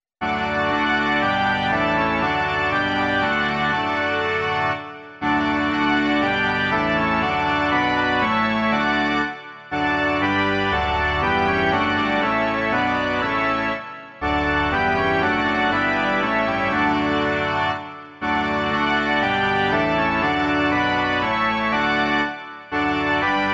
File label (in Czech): varhany